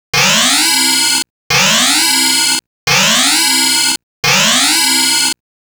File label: Alert; Toaplan Arcade Sound Digital Collection Vol.02